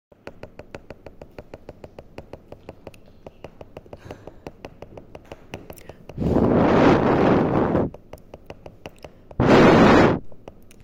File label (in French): tapotages tylo